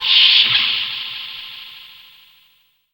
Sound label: Physical Ssshh; Jungle; Blu Mar Ten - Jungle Jungle - 1989 to 1999 Samplepack